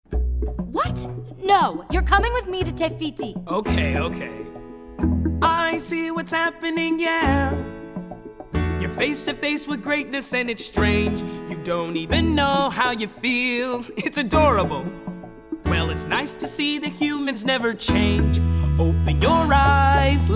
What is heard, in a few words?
guide vocals; audition